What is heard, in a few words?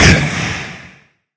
hurt, wither; minecraft; mob